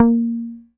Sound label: APX A#3, bass; Sampleheads; Will Lee Bass Library